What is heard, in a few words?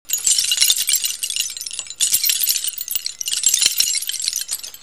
tribal sound